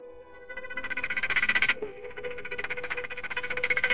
sad violin; sound effects

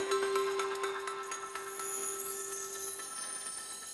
magic sparkle; sound effects

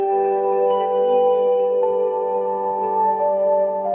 bell sparkle; sound effects

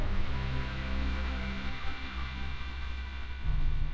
dramatic reveal

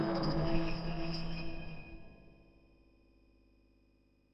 crickets

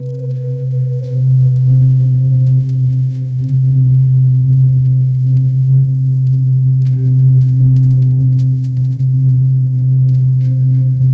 rain ambient; sound effects

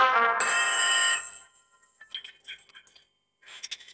tadaa fanfare; sound effects

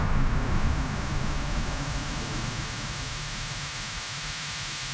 wind whoosh; sound effects

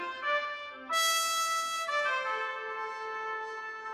victory fanfare; sound effects

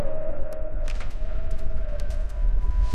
door creak; sound effects

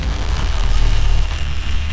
whoosh

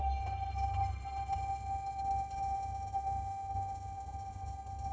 alarm bell; sound effects

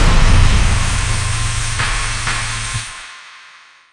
explosion

